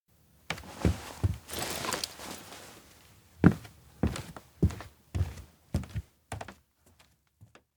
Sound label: steps indoor abandoned cafe exit grab bag; anemoi